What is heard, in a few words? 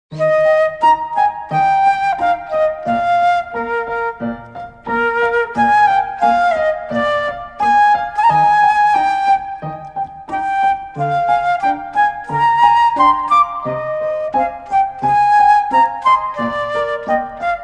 Piano Flute Wedding